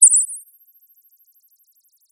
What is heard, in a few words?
Sound of a bird tweeting nicely